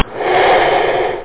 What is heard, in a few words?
breath out; Epic Collection 3, The; Epic Marketing; muzak